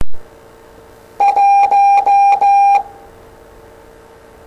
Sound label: Morse 1